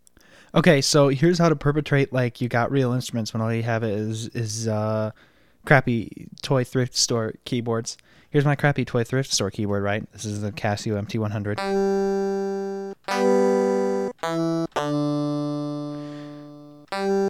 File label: casio szzzz